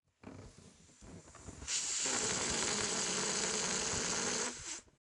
Balloon Deflating

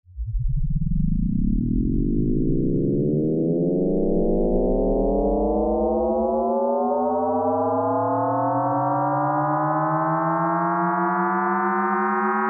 Siren Sweep Reverbed

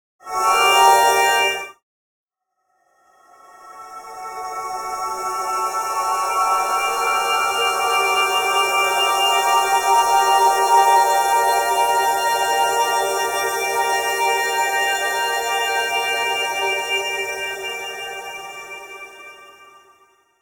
Violin

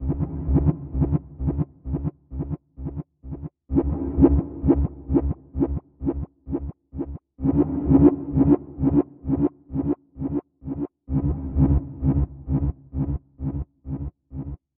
Deep Bass Wave 130

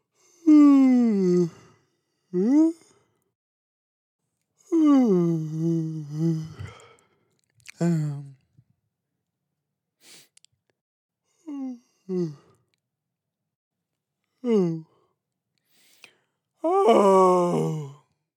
Overly Dramatic Yawning Male

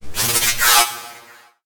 Scifi Short Circuit

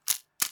Reloading Ammo

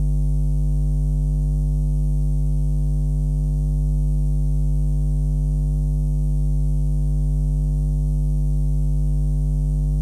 Power Buzz Loop

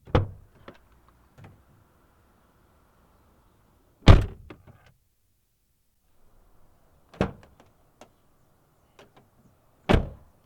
Car Door

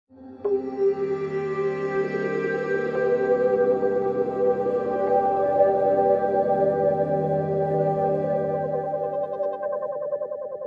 Shine

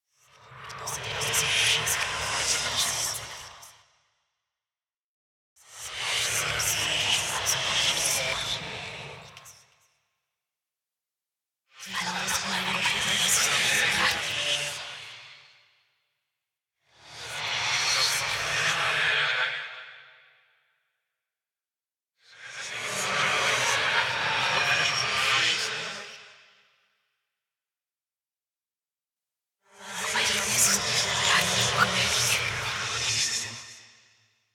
Oniric Voices

